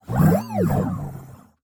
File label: pleased beeps; 堡垒（守望先锋2）; 局内触发; 投票史诗